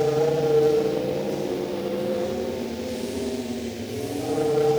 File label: Cracklature, sparse soundscape